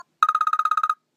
Apple Hillside Message Tone

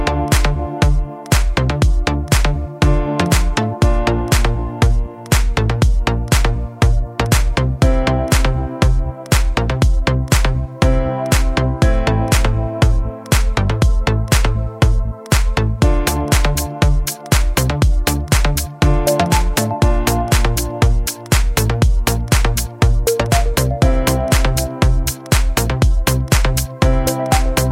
iPhone 11 Pro Max Ringtone

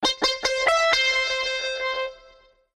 Samsung Galaxy Y Pro Duos Default Sms

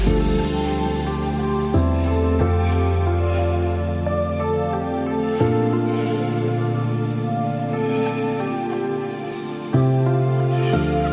Huawei Digital Ringtone

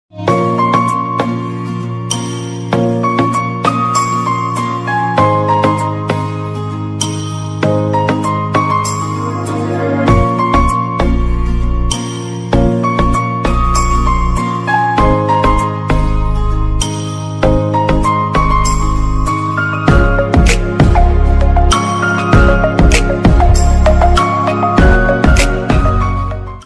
Love Ringtone

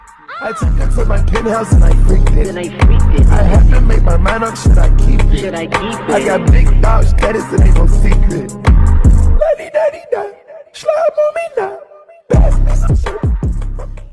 English Ringtone

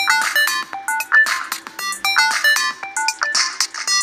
Ice Lead Ringtone; Vivo

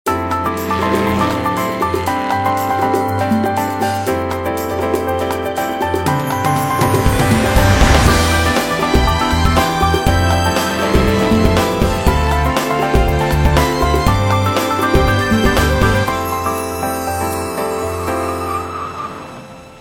Car Nokia Ringtone

